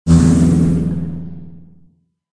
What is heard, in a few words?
fire buff in; krofdor